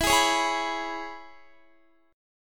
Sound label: E 6th, down, Guitar; strummed